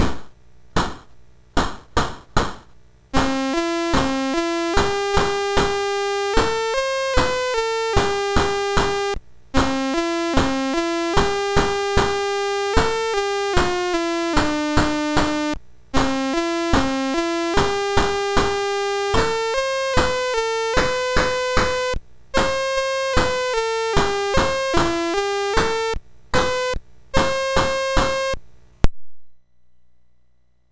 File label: sid MOS6581R3